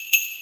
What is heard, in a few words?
Jingle Bells One Shot